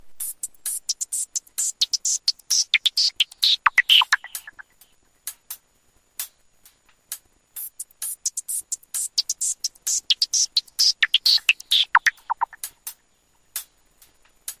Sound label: microsoft lumia hip hop